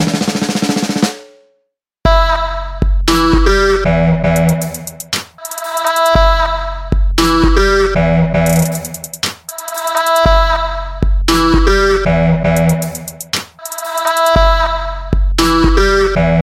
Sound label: Sick Beat